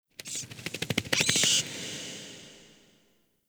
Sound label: Interior Cave Bat; Ambience; Duviri